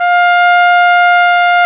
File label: BRS, Tpt Solo Class; Roland L-CD702; Tpt 1-4 Solo